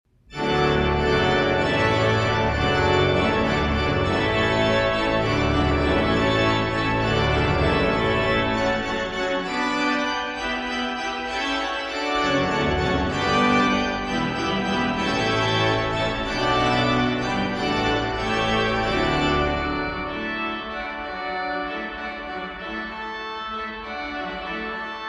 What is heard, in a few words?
With Trumpets and Horns; from Water Music; Handel